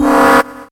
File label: alarm; Ueberschall; Techno Trance Essentials